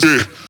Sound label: vocal shot d#; MINDMINE